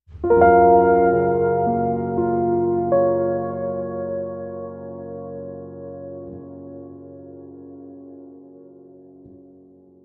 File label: Ethereal Piano Sting